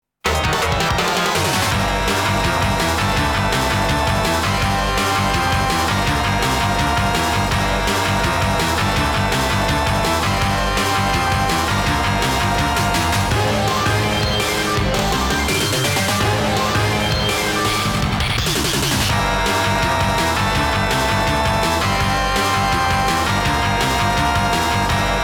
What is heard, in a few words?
Ranking BGM